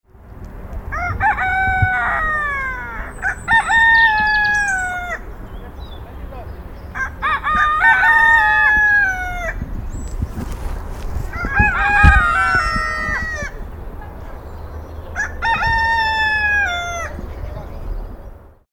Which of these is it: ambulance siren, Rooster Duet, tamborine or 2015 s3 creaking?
Rooster Duet